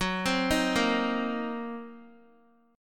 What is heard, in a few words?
Gb 6th Flat 5th Gb down Guitar; Listen to Gb6b5 arpeggiated